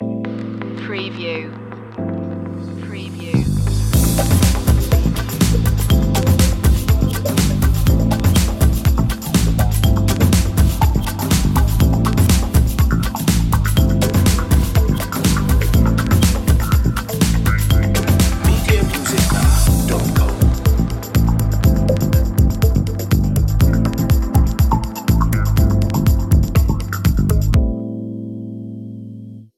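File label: underscore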